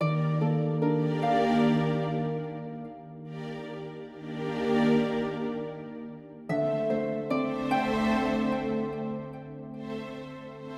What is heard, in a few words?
Alone in the rain acoustic version; Full of Stars Little Planets